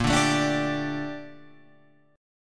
A sharp Major 13th A sharp Guitar Open F; A#M13; strummed; JGuitar

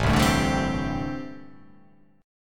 A Minor 11th A, Chord

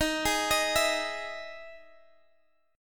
E Major 7th Eb

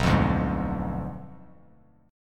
B Diminished 7th B down Bass Standard; Bdim7 strummed

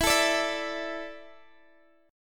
E 7th Suspended 4th, Guitar, Drop D

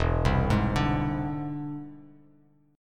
E Augmented 7th E down Bass Standard; arpeggiated

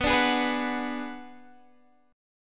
B-Minor 6th B down Guitar Standard; Bm6 strummed; JGuitar

